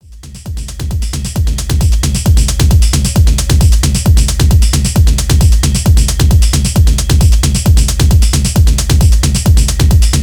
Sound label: Kicking (Locked Groove)